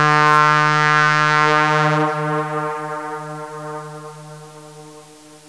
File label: horn; ze complex13